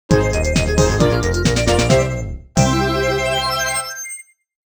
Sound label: Minigame Clear!; kururin paradise 2002 gba